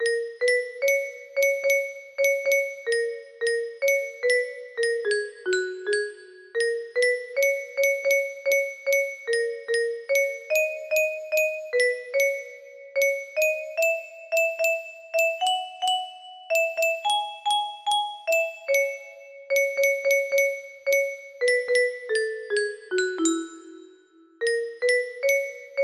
music box melody

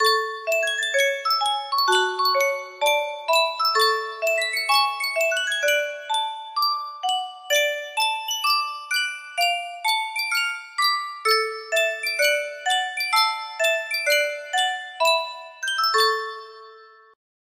Sankyo Custom Tune Music Box, music box melody

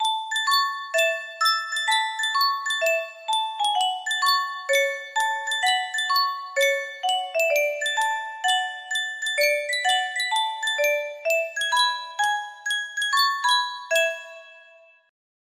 sankyo music box

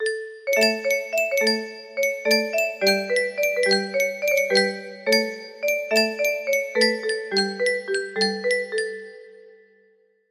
music box, low accents

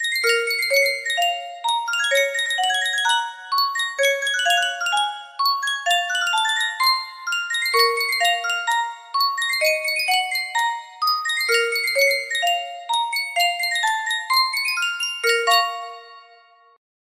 yunsheng custom tune music box

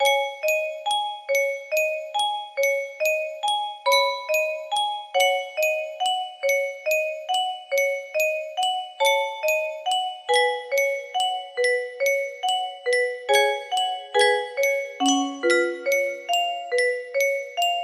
melody

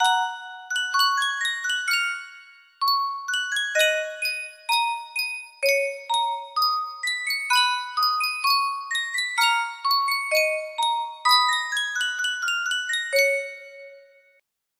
Sankyo music box, music box melody

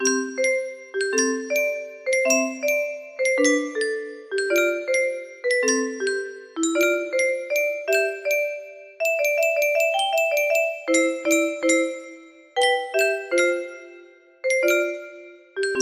music box melody

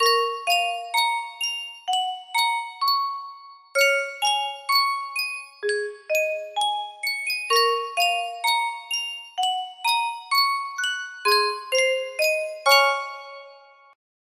music box

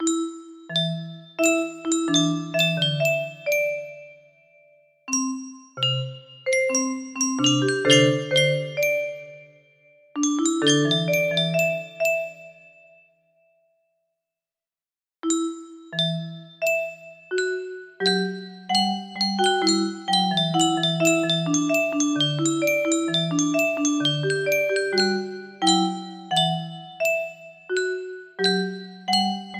musicbox arrangement, music box melody; punchout

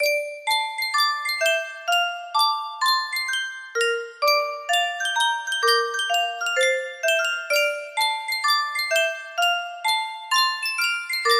Yunsheng custom tune music box

